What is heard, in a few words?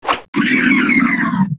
shoot; zelda cv